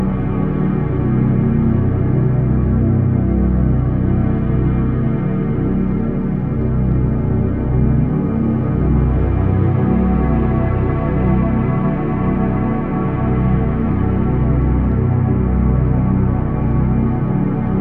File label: inner light loop; ゆめ2っき